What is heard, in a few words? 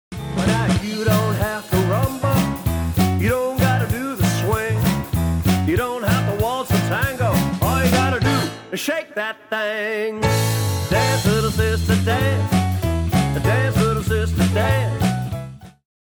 dance bridge ruff